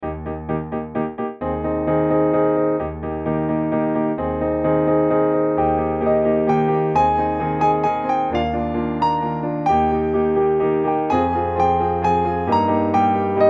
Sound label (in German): Wendy (instrumental)